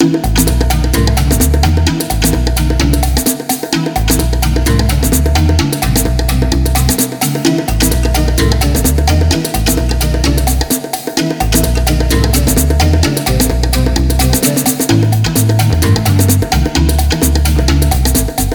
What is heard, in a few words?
jungle; Crash